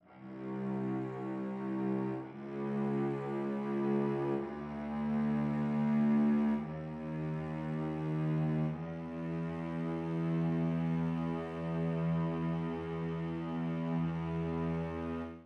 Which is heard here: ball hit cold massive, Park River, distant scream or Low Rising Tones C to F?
Low Rising Tones C to F